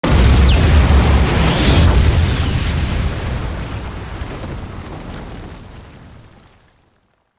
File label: explo bomb